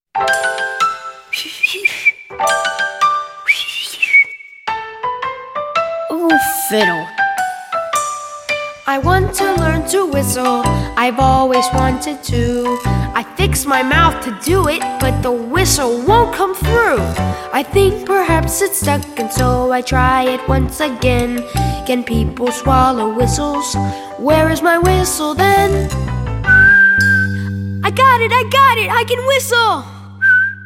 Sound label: Whistles; A Beka; Songs we enjoy 1